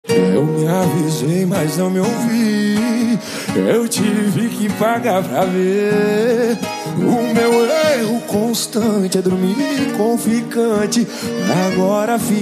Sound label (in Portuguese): sertanejo com locutores de rodeio